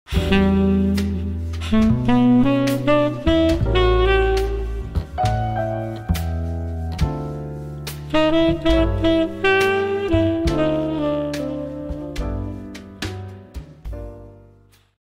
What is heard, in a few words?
Jazz music, بی کلام